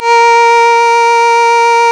Synclav FM STRINGS A#; Big Fish Audio

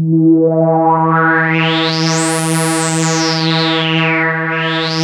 ARP 2600 E3, synthesizer; Hollywood Edge - Giorgio Moroder Rare Synthesizer Collection

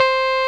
Roxichord, E.Piano

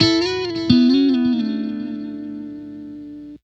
Guitars; Total Drum&Bass; 90 s Sample CDs

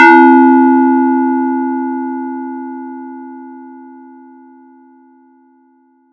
FX RND BEL; ModelCycles

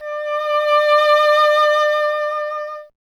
WND, Solo Instruments; E.HRN; Miroslav Vitous - Solo Instruments VOL-2

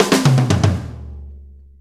6-8 Fill, Drum Tools

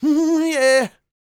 MMM, Vocal Blues Soul; Spectrasonics; Planet

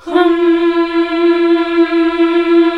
HMM F2, CLASSIC VOX8

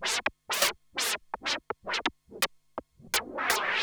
Juno-60 Arp 125BPM

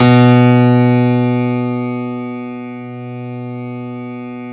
Stereo Grand, Keyboards; E-MU Producer Series Vol. 2 – More Studio Essentials

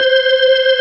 B3 C 5-4

